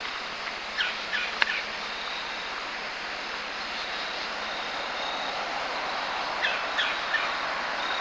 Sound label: bird